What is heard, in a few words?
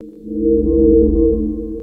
Pod, ambience spaceship